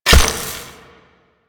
Rain Of Arrows Impact

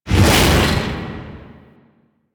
Heavy Hammer Whoosh; Warframe; Duviri; Dax; weapons

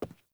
Boot Walk Wood, Footsteps; Characters; Enemy; Melee Grunt; Boots; Sinecure

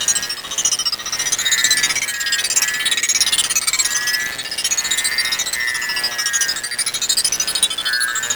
Case Friendly Capture Loop; Tau; Twelve Minute War; Cases

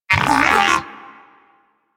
Moa Pet Agree; Warframe; Pets; Tenacious

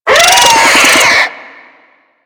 Infested Biped Death; Warframe; Moa

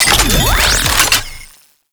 Shields Up Battery Delivered; Tau; Twelve Minute War

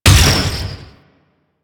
Ship Dual Cannon Shot; Crp Moa Dual Cannon

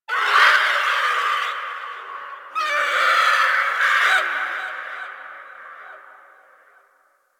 Distant Zone Four Creature; Warframe; Ambience; Eidolon Plains; One Offs

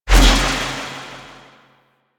Alt Throw, Spear Gun; BE Ferrox

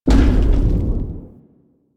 Step D; The New War; Sentient Throne Room Gameplay; Erra

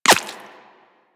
Bullet Impact Flesh; Warframe; Weapons

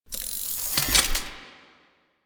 Sticky Bomb Reload Ready; Grineer; Veilbreaker; Vor

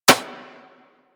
Bullet Impact Generic Metal; Surface

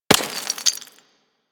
Bullet Impact Debris Rock; Warframe; Weapons

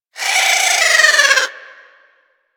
Avichaea Vocal Idle; Warframe; Hunting Critters

